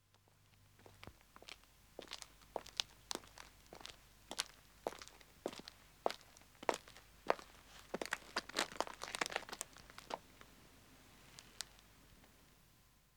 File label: Country Road Walking 2 men ap, Footsteps; The BBC Sound Effects Library